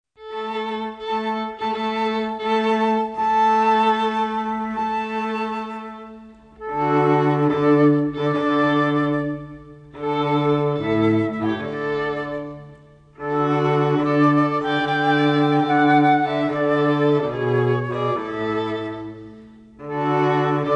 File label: Bridal Chorus Flute Trio; Wagner